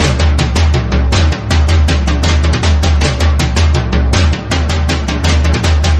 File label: drums; horde